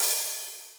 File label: subtle reverb open hi hat one shot g key; Duration 0:01; Samplerate 44100 Hz